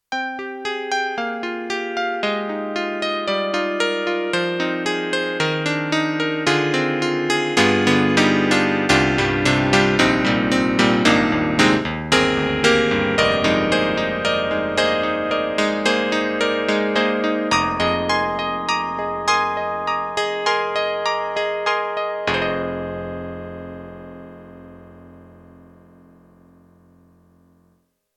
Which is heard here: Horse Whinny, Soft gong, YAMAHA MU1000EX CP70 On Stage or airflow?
YAMAHA MU1000EX CP70 On Stage